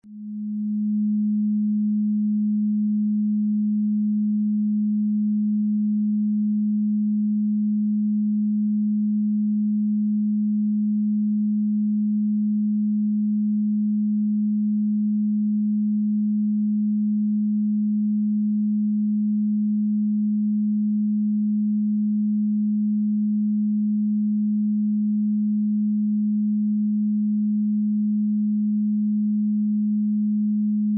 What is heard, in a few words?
42Hz; binaural beats